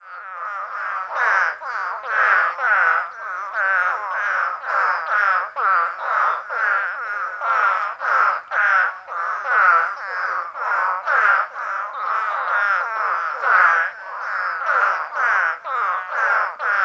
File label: Scaphi hurteri stereo chorus, Spadefoot Toads; Hurter's Spadefoot Chorus - DeWitt County; Hurter's Spadefoot Toad (Scaphiopus hurterii); DeWitt County, Texas